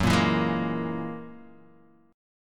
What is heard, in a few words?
Gb 9th Gb down Guitar Open D